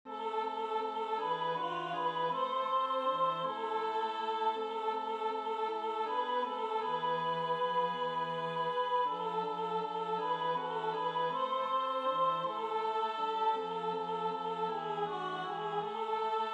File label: soprano